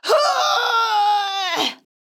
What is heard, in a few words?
woman pained groan